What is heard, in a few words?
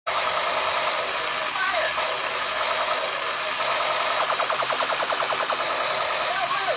Fire toy gun